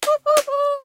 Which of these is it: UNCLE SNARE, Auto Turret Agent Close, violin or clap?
clap